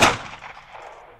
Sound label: gun shot; Crash Bang Boom